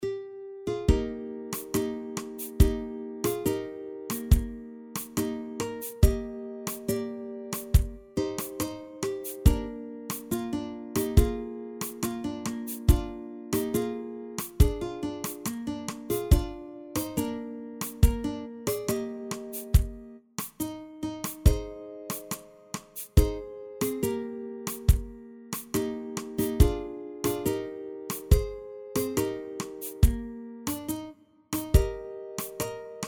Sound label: Melody Drums